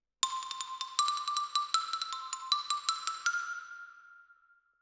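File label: Xylophone Close, Jazz for piano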